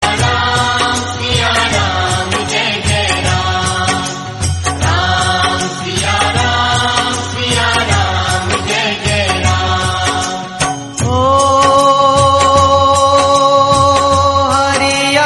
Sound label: devotional song